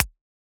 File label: Perc, Percussion; FLPRO